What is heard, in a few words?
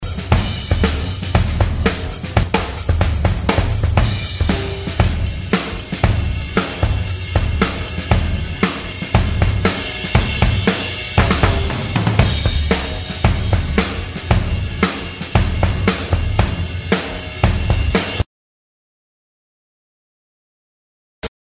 Drum outtakes